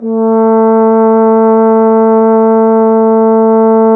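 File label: bass trombone; Miroslav Vitous - Solo Instruments VOL-1; 90 s Sample CDs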